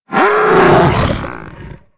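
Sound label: bantha attack; Star Wars Knights Of The Old Republic I; XBOX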